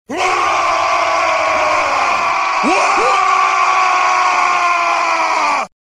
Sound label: waaagh